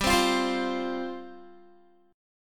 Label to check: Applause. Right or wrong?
Wrong